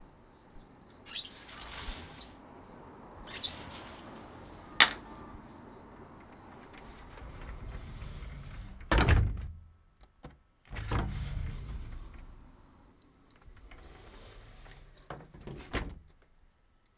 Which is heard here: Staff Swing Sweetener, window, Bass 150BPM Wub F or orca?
window